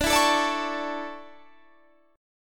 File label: D Major 7th Suspended 2nd